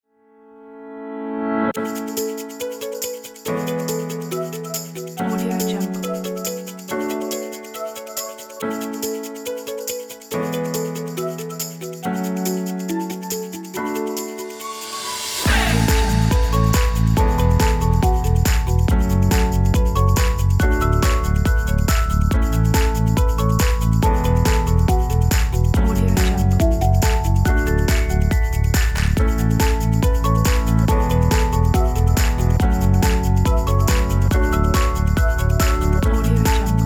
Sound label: uplifting motivational happy upbeat